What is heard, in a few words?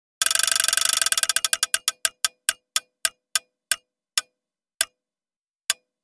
wheel of luck